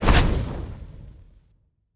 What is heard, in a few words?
cast fire; smite; god merlin